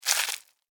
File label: Insect, Eat, Chew, Bite, Bug; Crunch; Animals:Insects; Insects Eating; Blastwave